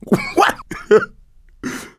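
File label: laughter, male; survivor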